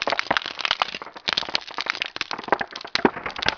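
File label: ambience crackling ice loop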